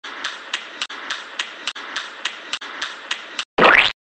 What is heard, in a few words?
splash